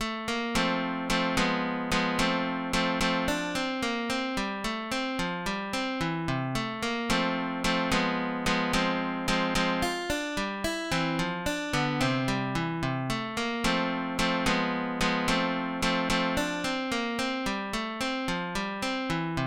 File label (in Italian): andante in Fa, guitar; Ferdinando Carulli